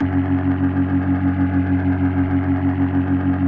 B3, First Call Vintage Keyboards

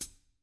HTRA FOOT, Drums, TAMA; ILIO; Double Platinum Drums 1; TAMA+TAMA

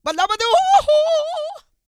SING, Vocal Planet R&B & Dance; ILIO

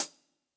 Acousti Hat Hit, hihat; ultimate hihat; Hits; AcoustiHat B

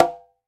CONGA, Conga Battery

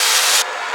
FX Loop, Drums & Drones

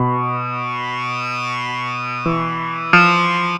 2 Step, 133; USB Soundscan vol.51; House Side Of 2 Step; AKAI

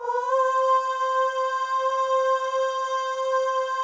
OH, FEM, Symphony of Voices

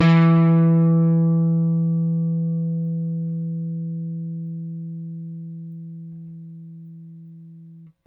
RickThumb-C, RickenbackerBass

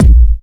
KICK -L, Disco House; USB Soundscan vol.20 - Fresh Disco House I

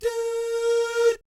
DOO SFZ, Jazz FX; Spectrasonics; Vocal Planet; JAZZCOMBO

